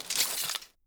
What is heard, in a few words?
Weapons, Bow, Grab Arrow, Drag, Pull, Bag Rustle; DeltaVR; FMOD; Objects Pickable